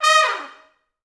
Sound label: Pop Brass, D#4; Best Service; ProSamples vol.19; AKAI